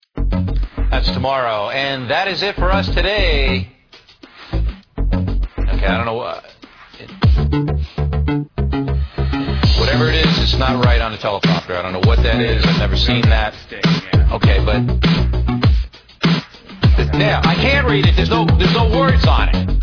Dubstep Remix

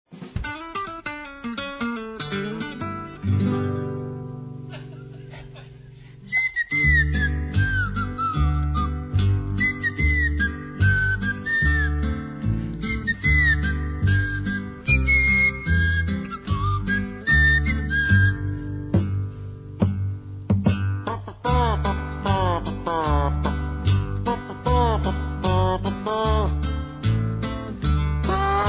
Whistle

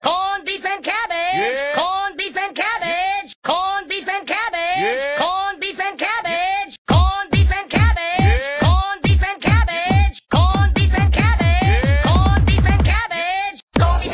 Dubstep Remix

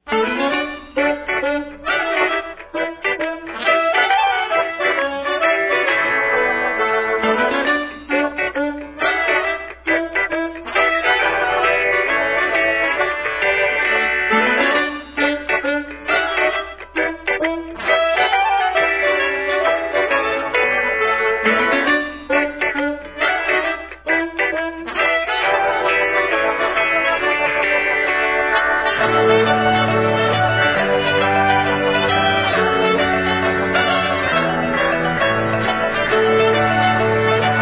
dub version schottische

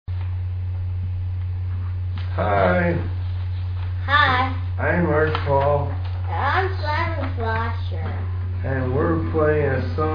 ive Got a Guitar

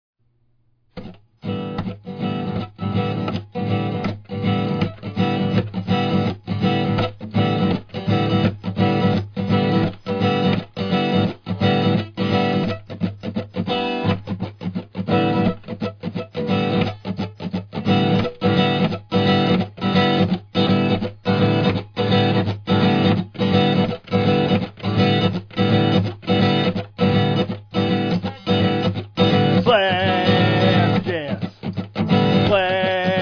Slap Jazz

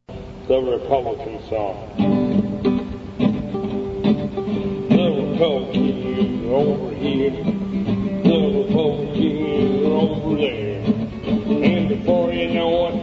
Republican Song, Guitar version

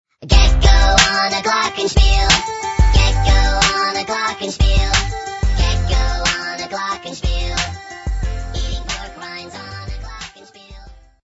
Gecko on a Glockenspiel

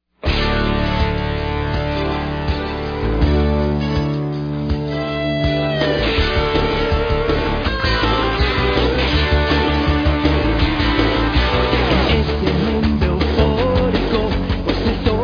Spanish Version of

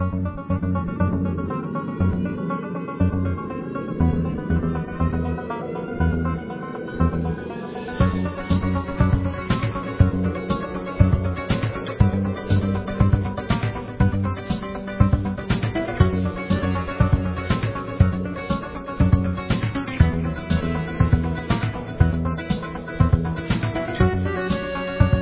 Banjo Cover

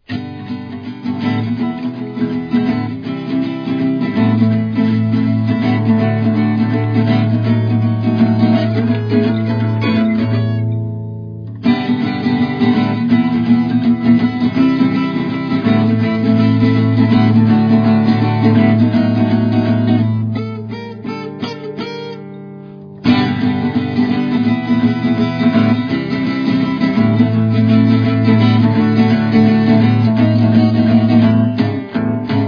Instrumental

